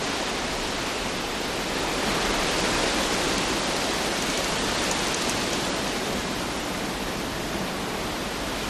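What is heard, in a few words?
noise of foliage on a tree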